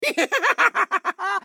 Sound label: laugh; MK9; Joker